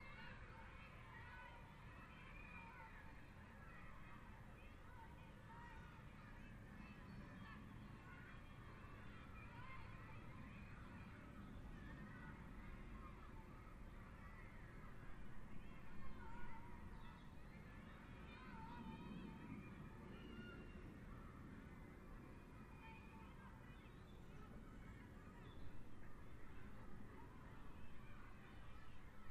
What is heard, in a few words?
Room Tone Open Window, air fill